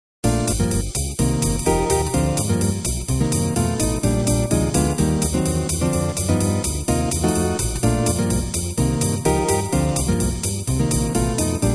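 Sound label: trio, guitarist, jazz; MIDI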